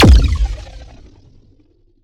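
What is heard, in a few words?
Sci weapon Shot Medium Blaster Pounder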